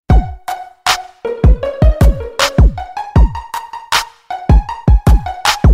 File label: instrumental